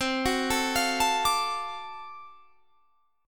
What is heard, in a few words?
D 7th Sharp 9th C, chord